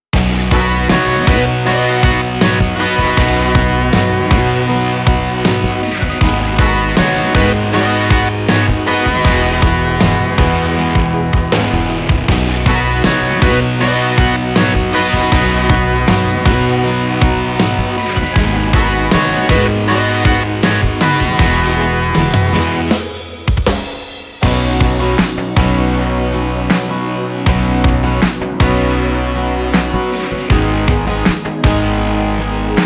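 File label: Slow Genos Rock, Yamaha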